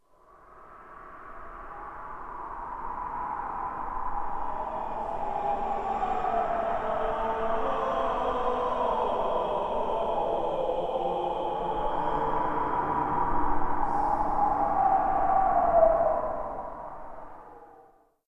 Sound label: Singing; l ange et le demon cd i gamerip 1992